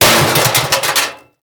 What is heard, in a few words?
trash notification; fcc